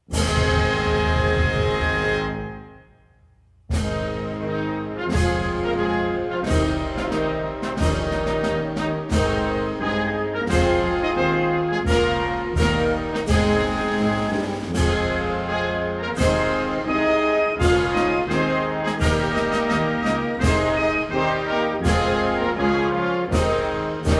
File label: anthem